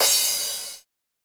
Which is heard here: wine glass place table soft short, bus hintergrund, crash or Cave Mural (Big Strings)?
crash